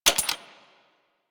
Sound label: Rifle Alt Mech B; Sundial